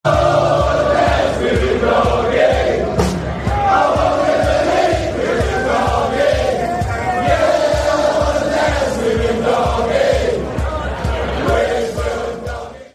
player chant, fan chants; udogie; tottenham

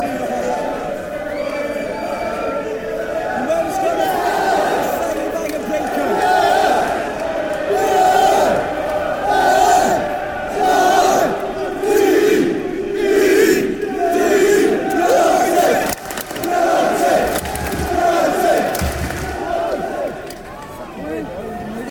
chants; spell it out

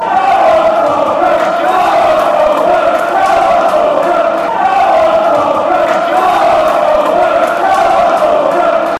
come, chants; falkirk

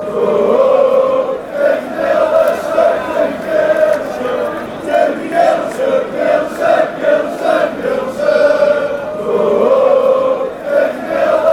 give a beer

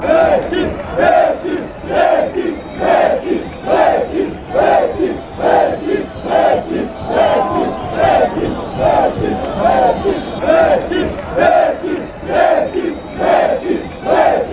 cska chant

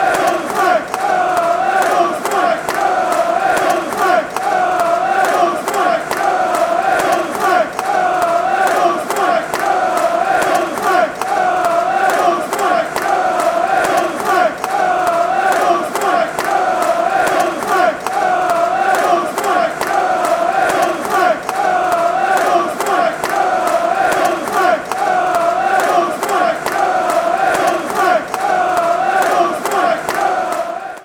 fan chants; england

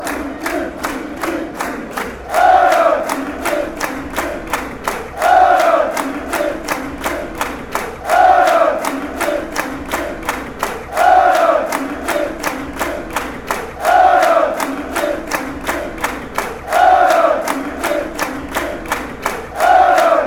den haag chant

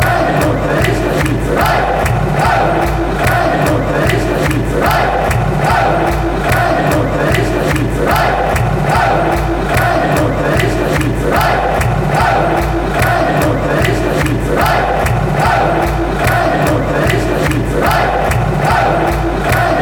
hey hey fan chants

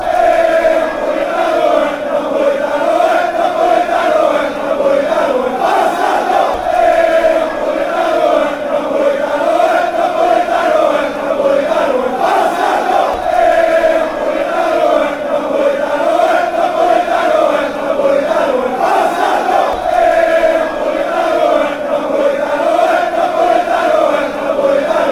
fan chants; neapolitan; Brescia Sing

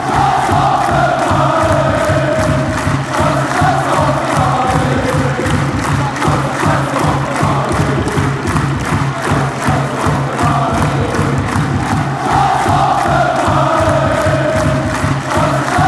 chants; sit hoffenheim

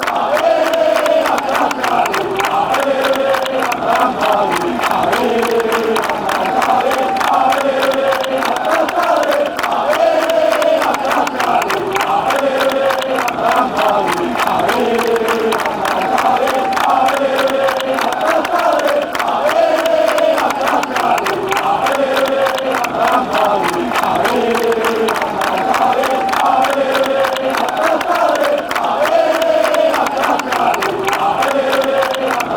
ale, fan chants

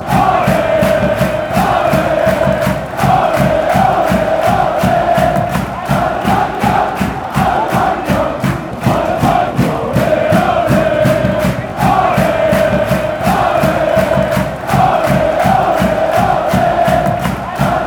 ole, fanchants

